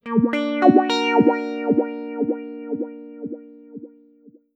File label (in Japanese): モヤモヤ音